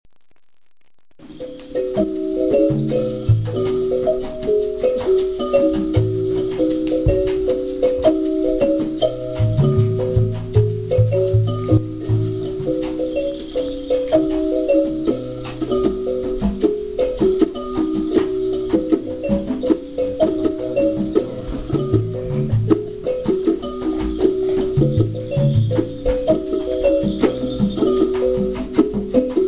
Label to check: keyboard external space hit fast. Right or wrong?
Wrong